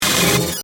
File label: Glitch Power Down; Glitch Sound Effects; BlastWave